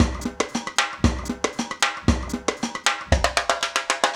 block

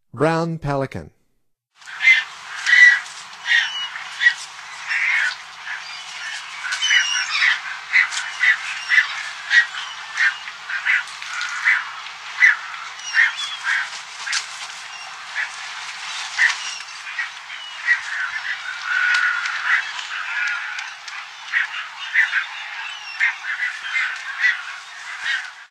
Brown Pelican; North America; Stokes

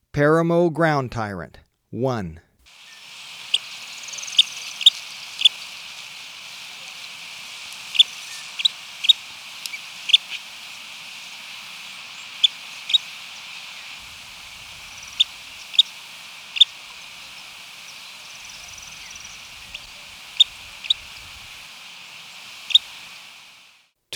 Paramo Ground-Tyrant, Birds of Ecuador; S. America

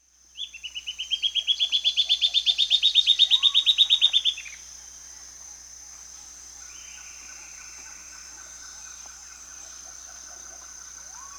Silvered Antbird (Sclateria naevia), Peru; S. America

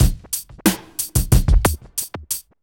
hiphop squelch 91

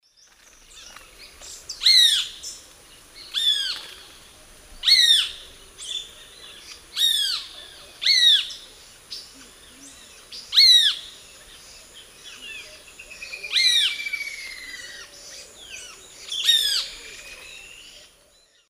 Pitangus sulphuratus, calls 2 birds; S. America; Eastern Lowlands

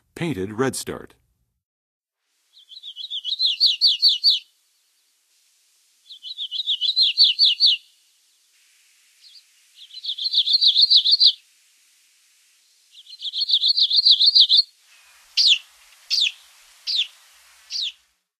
Painted Redstart; North America; Western USA; Western USA Songbirds

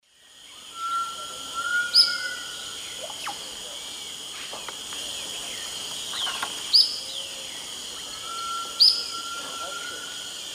Tolmomyias viridiceps, calls; S. America; Eastern Lowlands

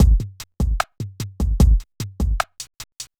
hiphop

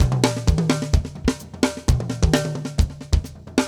metal

